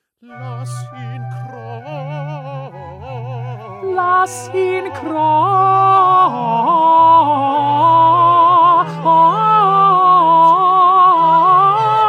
Tenor; Bach; St Matthew Passion

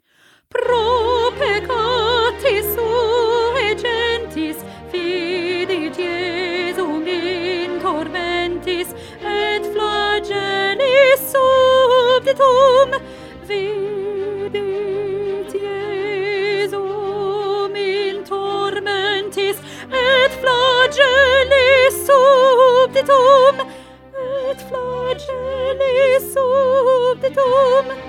Soprano; Pergolesi; Stabat Mater

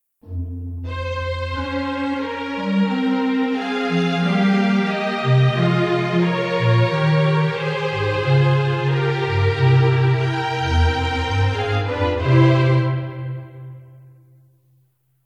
Instrumental

